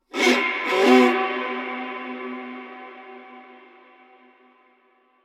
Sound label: Viola